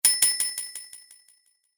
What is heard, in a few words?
Bell Sound With Delay; Bell Sound Effects